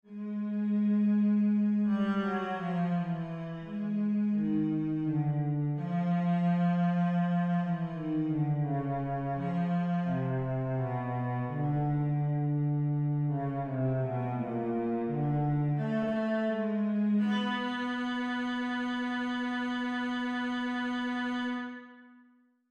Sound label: cello; RK Golden Cockeral